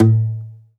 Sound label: Domra A1 Mute; Multisamples; Digital Sound Factory Planet Earth